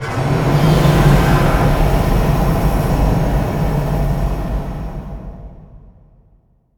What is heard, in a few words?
nuke hit; Drunken Robot Pornography; Inago Rage